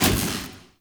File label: Orb Cannon Orb Destroy, Powers